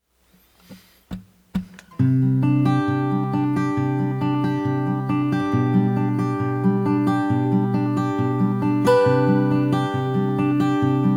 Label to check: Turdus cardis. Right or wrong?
Wrong